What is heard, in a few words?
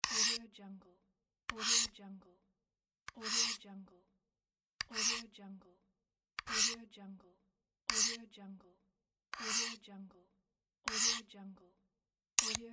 pocket knife sharpening with a ceramic stone